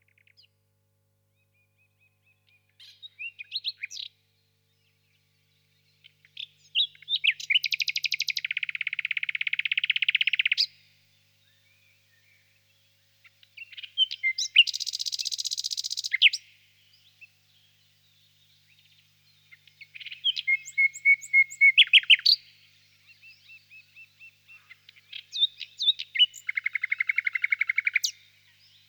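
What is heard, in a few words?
nightingale in the swamp; na bolote solovei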